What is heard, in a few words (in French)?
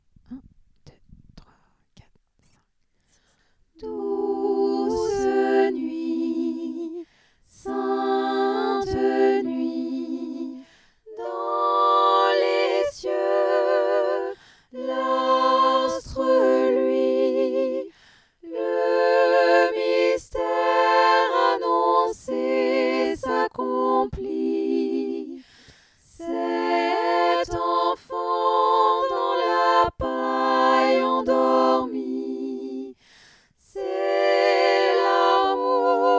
douce nuit tutti, a capella; sainte nuit; traditionnel noel